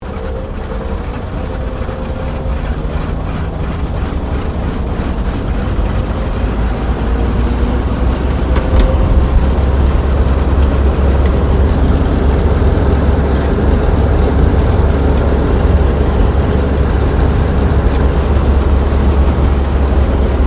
AIRCRAFT HAWKER SEAFURY TAXI INTERIOR, fighter-bomber; Airplane; Vehicles; Airplanes; Aircraft Collection; Twistedtracks